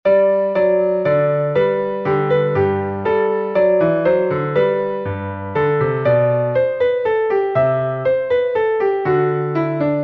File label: Minuet from Overture in G minor; BWV 822